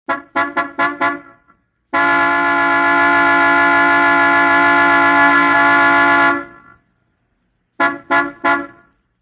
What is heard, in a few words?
1950 Car Horn Brassy